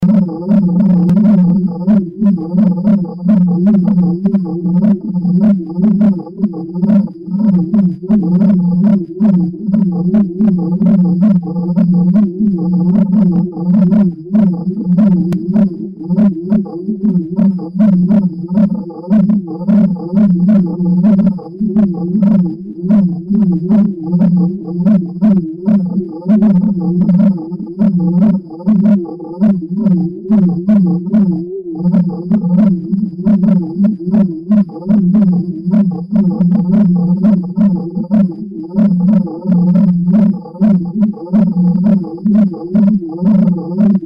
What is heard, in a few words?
Cricket